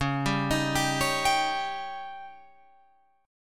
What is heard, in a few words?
Db Suspended 2nd Flat 5th, Guitar, Open F; arpeggiated